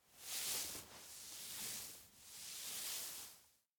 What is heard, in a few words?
pat head soft; anemoi